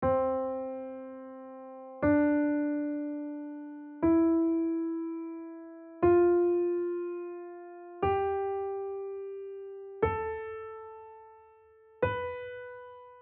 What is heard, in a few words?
C Major Scale